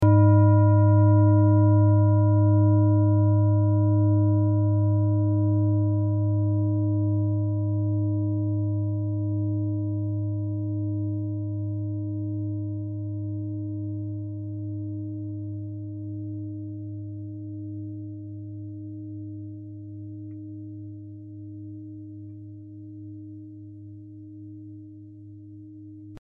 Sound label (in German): klangschale set; Klangschalen-Chakraset; Gewicht = 2140g; Durchmesser = 27,9cm; Höhe = 11,7cm